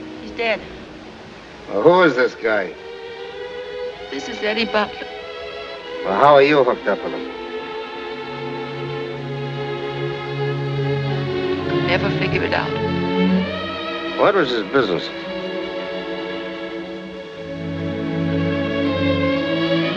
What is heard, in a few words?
roaring20s